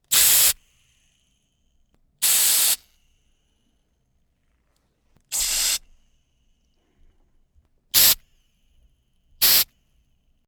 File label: spray